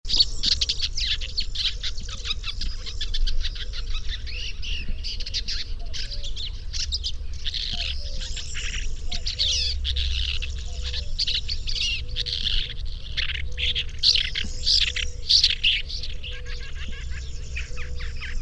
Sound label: woodchat shrike